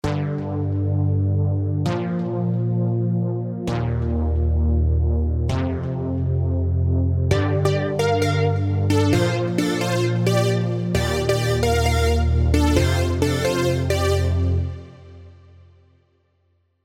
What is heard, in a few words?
VHS; spire old skool